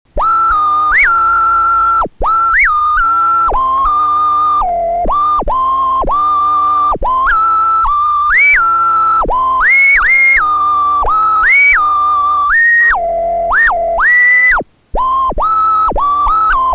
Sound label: tone ahaahuaua; zachtkind